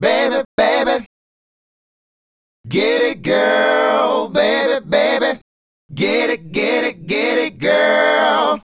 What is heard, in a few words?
Male Group, Hip-Hop and RnB Vocals